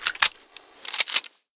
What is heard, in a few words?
sniper rifle reload